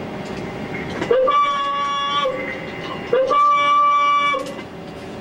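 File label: Jap diving alarm, AUTHENTIC NAVY ALARM SOUNDS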